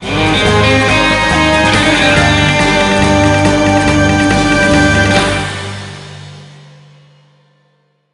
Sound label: Level Complete; Suske en Wiske De Texas Rakkers; NDS